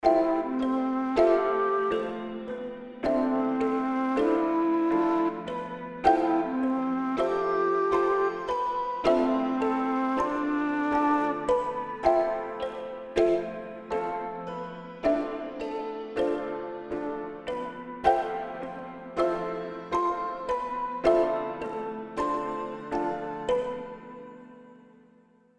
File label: freaky dancer 160 bpm